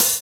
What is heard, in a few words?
Open Hhat, Hihat; Equipped Music; Loungin House